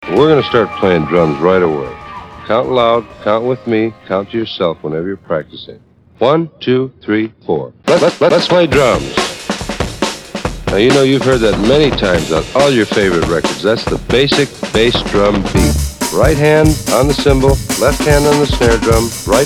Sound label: Lets Play Drums